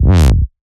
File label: Slide G, Bass